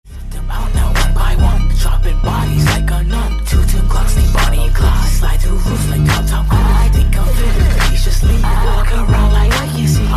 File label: slowed